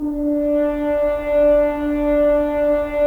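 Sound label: Solo French Horn; Roland - LA Composer vol. 4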